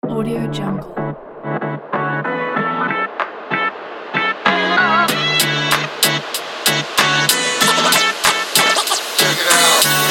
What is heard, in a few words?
hiphop kit